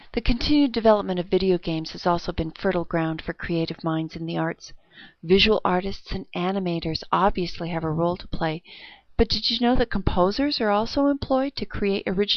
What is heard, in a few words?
video games